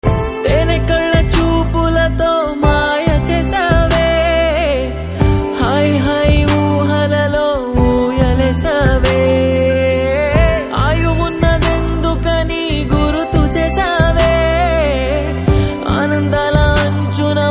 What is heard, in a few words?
Telugu Ringtone